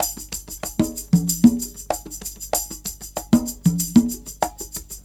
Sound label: Percussion, R&B Drums